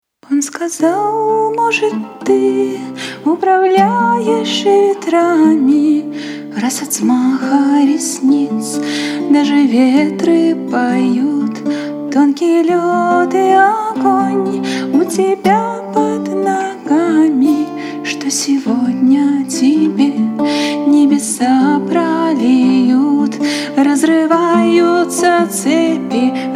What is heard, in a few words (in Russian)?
trostnikovaya flejta